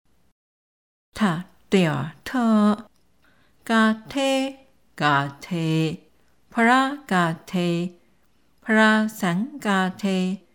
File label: mantra heart sutra